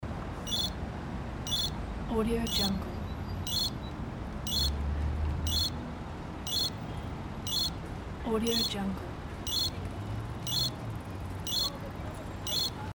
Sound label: traffic and signal of pedestrian crossing